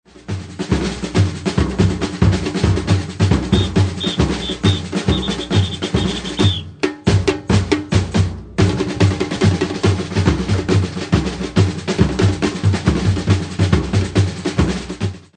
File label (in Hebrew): Whist reverse, OFFBEAT שריקה; Batucada